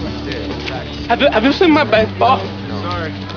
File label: baseball